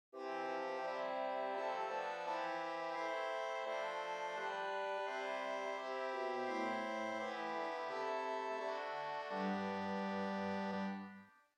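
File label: Swell Viola 8, organ stops; Hauptwerk; St Annes Moseley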